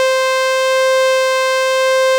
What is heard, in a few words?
ARP Chorus Leg, arp strings; loopable